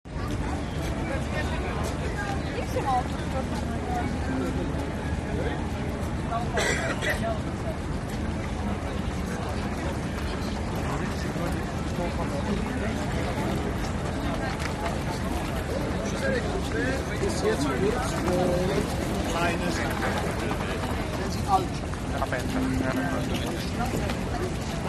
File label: prague crowd day on karluv most charles bridge street musician playing heavy crow; movement